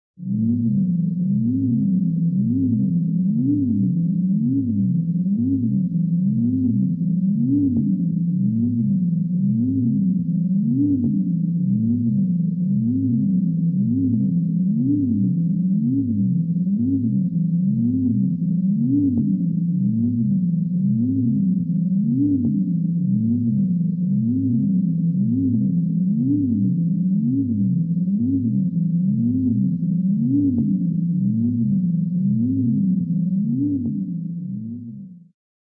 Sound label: sci fi, evil saucer; space ghost